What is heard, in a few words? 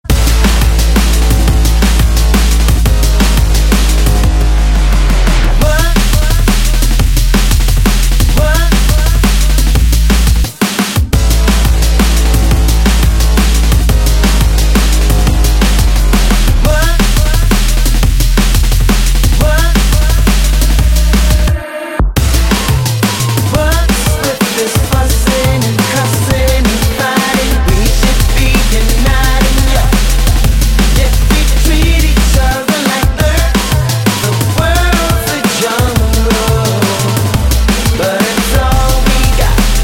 win music